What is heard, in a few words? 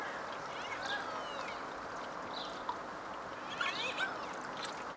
Common Pochard - song, Tafelente